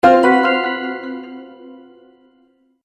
Magical Surprise; Magic Sound Effects

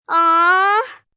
"Aww", Reaction, 1 girl, 6 yrs; Exclamation; VoiceBox

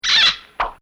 Comedy Effect Squeak And Pop; Funny Sounds; Bobby Cole

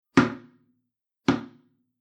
Tennis 2 Hits; sports; Tennis Sound Effects

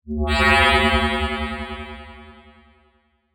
Electronic Pulse, Ray, Science Fiction; Sci Fi Sound Effects; Blast Wave

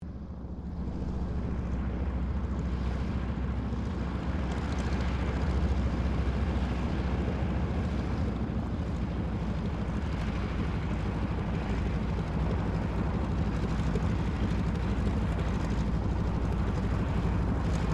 AIRCRAFT AT-6D HARVARD MK III TAXI; Temora Harvard MK III; Airplanes; Vehicles; Airplane Sound Effects

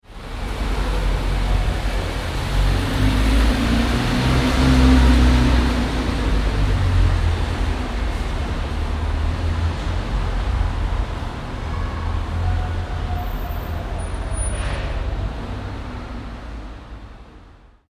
ambience urban city multi story car park quiet; Inside Of A Multi - Storey Car Park Ambience - Car Driving Between Levels , People Shoutin; Backgrounds; Car Park (Internal) Ambience; Cities; McKinneySound

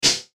Error Message

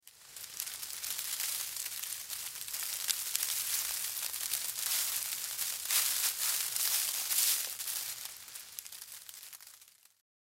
Hoof Movements Hay, Hoof Movements Hay Feet Barn; Close Perspective Hooves; Farms; Farm Sound Effects; Animal

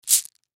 Marbles Bag Set Down; Set Downs (Sound Effects:Objects); Foley; Blastwave

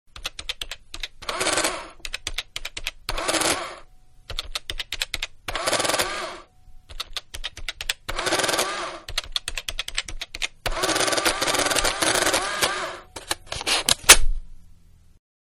Adding Machine Electric; Miscellaneous Electronic; Electronics; Manhattan Production Music

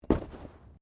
Body movement Organic Impact Medium; Body movement foley to simulate falls and deaths; Wrestling And Struggling

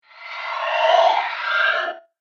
Swallow That Ghost, ghost sound; Ghost Sound Effects; Andrew Fleming

